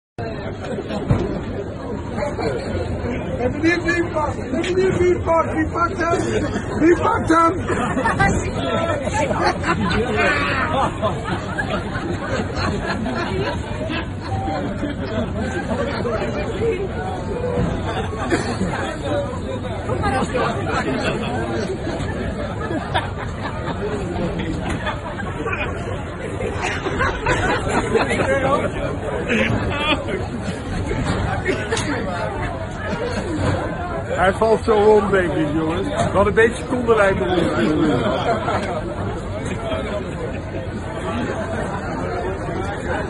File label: laugh; breadradio